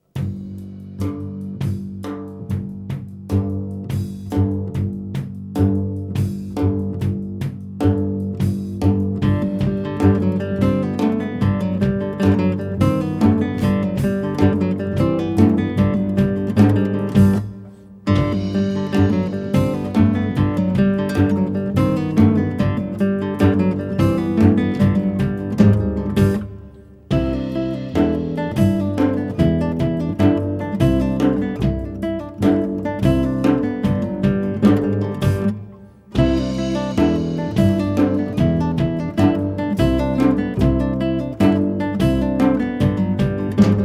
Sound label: Walk dance; South American music for guitar